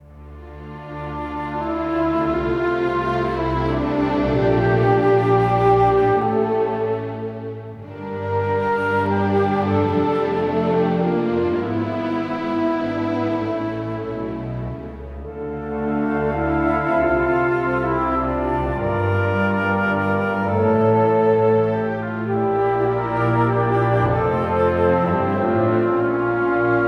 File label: Orchestral Emotional